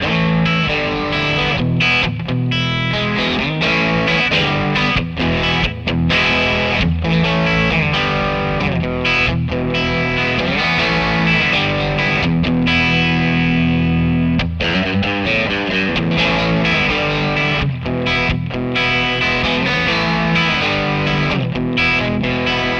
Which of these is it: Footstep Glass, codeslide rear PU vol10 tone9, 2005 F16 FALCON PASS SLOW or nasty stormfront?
codeslide rear PU vol10 tone9